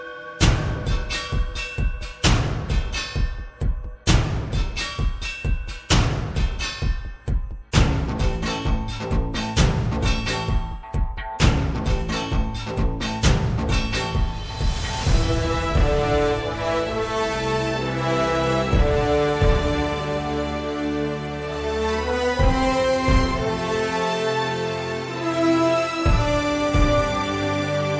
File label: Theme Song; Mirzapur